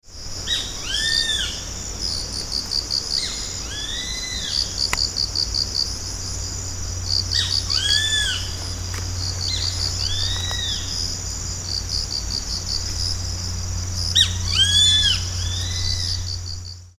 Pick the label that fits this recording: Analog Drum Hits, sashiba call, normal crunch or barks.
sashiba call